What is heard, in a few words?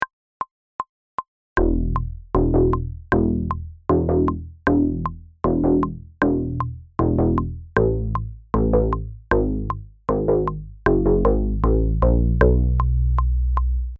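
Synth Bass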